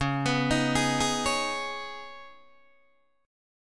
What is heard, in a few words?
C sharp 7th Suspended 2nd, C sharp, down, Guitar, DADGAD